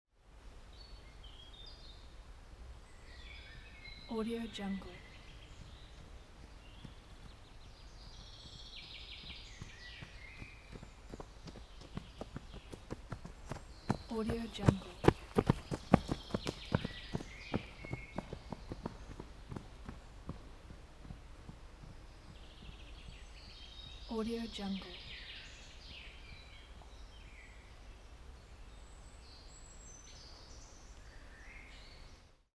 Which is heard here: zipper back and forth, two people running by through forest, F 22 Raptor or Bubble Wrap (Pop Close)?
two people running by through forest